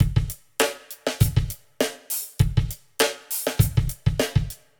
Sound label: 100 Crystal Kit, Warped Live Drums; Loops; Touch Loops; CosmicFunk